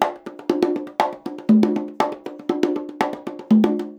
120 CONGAS, Percussion Loops

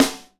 snare, Hip-Hop Rap; Northstar; Drumscapes Roland

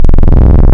VCO drift, wavetables; Animoog

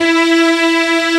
STRINGS E3, Analog, Synth; E-MU Formula 4000 Series Vol. 3; Analog Odyssey; Synth Builder

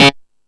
Killer Synth, E-MU Pro-Cussion; E-MU Pro-Cussion-1

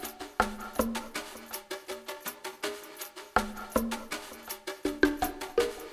PERCFX; Spectrasonics; Liquid Grooves 1 & 2; RESOSOUL

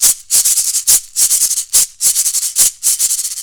MIRACA, Indian Traditions; USB Soundscan vol.45; AKAI

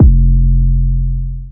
F 808, bass

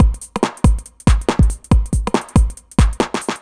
140 909, Drum Loops; Zero-G; Soundclash Nu Dance Electro; Acidized Wavs; 140bpm; Ass Track

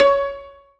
zimbal C#4; ZIMBALON

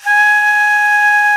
VENU G#4, Old World Instruments, World Winds; E-MU Producer Series Vol. 7 – Old World Instruments; World Combos

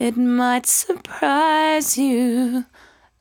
74-5bpm B, Velvet Vocal; While U Could; Zero-G

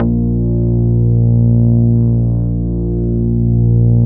A#1 ANA BS; Synclavier Sampler Library; KEYS AND GUITARS

